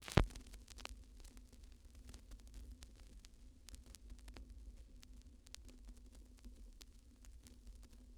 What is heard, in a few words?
Vinyl Crackles